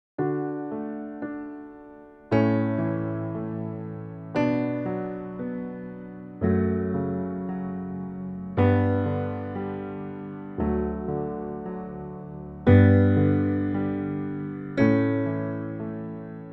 Canon in D Pachelbel wedding processional, jazz piano